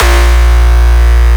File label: BASS SHOT 5th